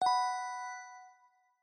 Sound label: win small; riviera Star; Fantasma